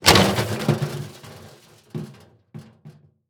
Impact Metal With Debris Hit Cement

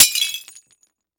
Impact Glass Debris Thrown On More Debris; Impacts

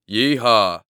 "Yee-Haw", Human Male Deep

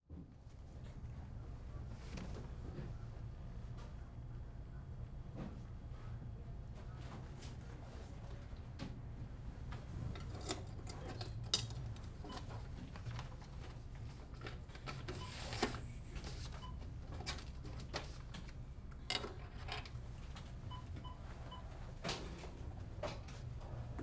Ambience Shipping Store; AdobeCC

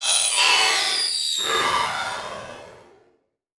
Title Transition Electrical

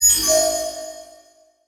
Multimedia Internet CD-Rom Flash Beep Multiple

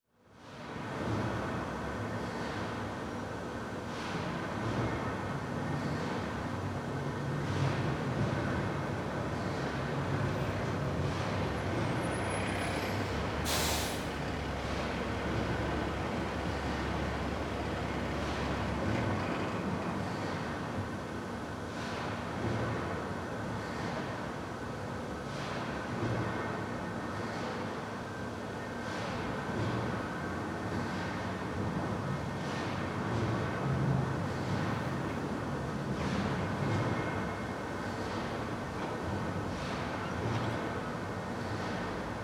Ambience Factory Big Machine; AdobeCC

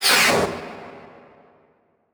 Transition Whoosh Short; Production Element; Title Transition; AdobeCC

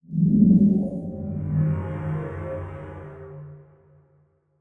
Transition Electrical Warble; Adobe; Production Element

